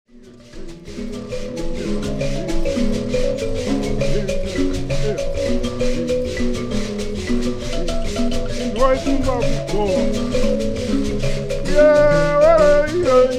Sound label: mbira